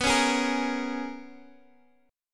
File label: Bb Minor 7th B; Bbm7/B strummed